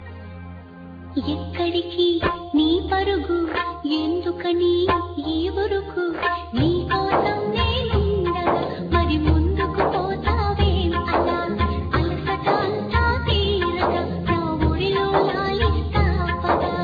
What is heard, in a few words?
Romantic Song